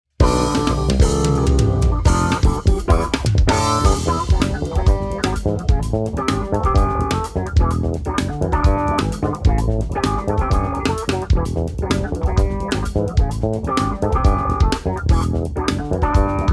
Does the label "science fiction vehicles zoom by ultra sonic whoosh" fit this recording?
No